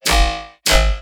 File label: BASS SHOT